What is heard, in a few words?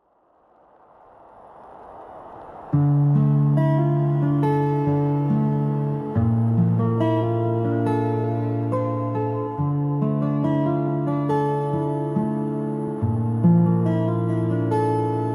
Guitars Embrace